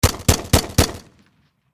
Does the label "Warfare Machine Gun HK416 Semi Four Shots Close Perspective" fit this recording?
Yes